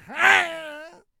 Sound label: Tempest Lich Attack Vocals